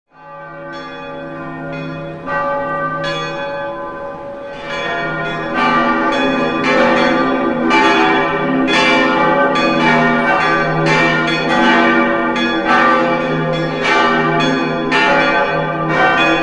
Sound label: Cathedral Bells Ring, bell towers